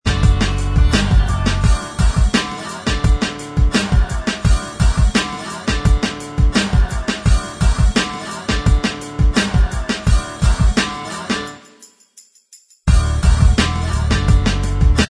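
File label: instrumental track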